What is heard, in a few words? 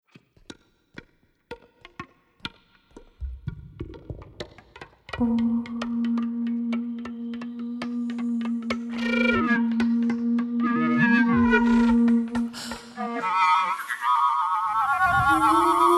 Voc Flute